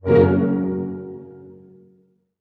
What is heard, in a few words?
HHIT F# MJ, HARP HITS; Sound On Sound - Peter Siedleczek's Orchestra